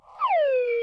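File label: Birthday-Card Balloon-Rise Reverse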